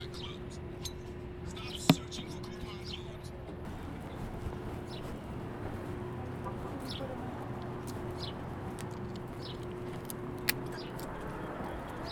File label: Alamo Fuel Station; XYMS